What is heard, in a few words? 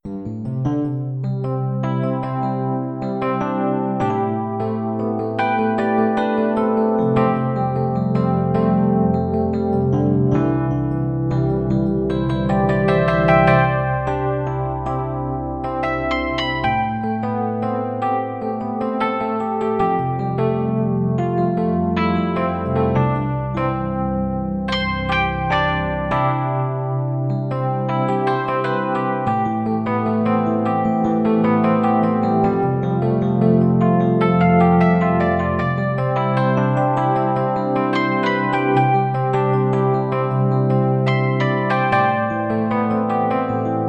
Midi Rhodes